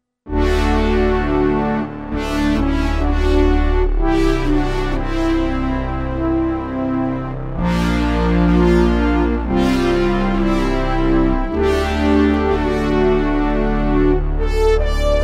analog horn; moss

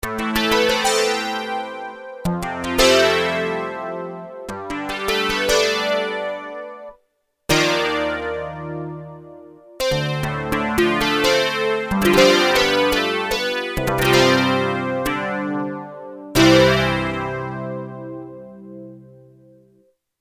v-synth fm ep

